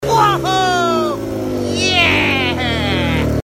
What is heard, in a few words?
wohoo yeah